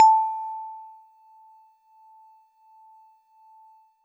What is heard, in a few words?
Warble Celeste DX100 A-1